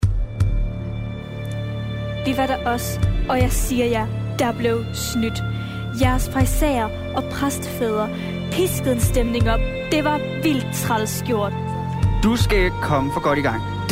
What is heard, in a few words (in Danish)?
Drama musik og drama